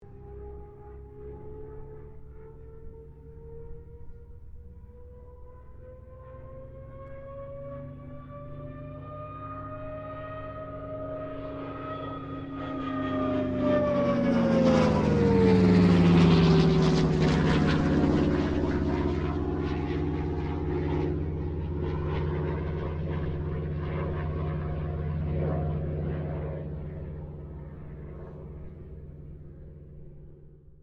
AIRCRAFT CA-13 BOOMERANG PASS STEREO; Airplanes; Vehicles

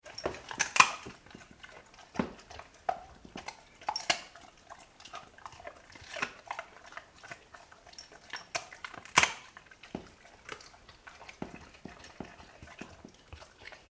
animals dog labrador eat chicken bones; Dog Eating Bones; Dogs; McKinney Sound

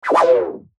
Rollover Stutter Whoosh

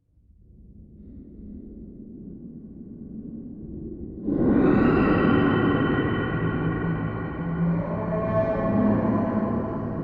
Dungeon Drone Horror Rumble Metal Crashes Voices; Scary Soundscape

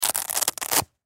Shoe Velcro Rip, Shoe Velcro Undo Toddler Rip; Shoes; Objects; Blastwave; Foley

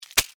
Scorpion Tail Snap, Stinger, Attack; Animal; Arachnid, Insect, Bug; Scorpion Sound Effects

